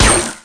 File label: ice Cannon Impact; NETSTORM